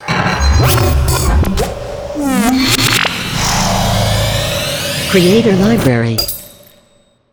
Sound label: Hi Tech Stinger; Logo-Idents